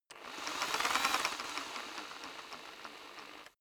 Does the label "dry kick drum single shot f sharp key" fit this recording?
No